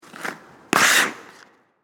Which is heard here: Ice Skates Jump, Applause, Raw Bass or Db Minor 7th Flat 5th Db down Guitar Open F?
Ice Skates Jump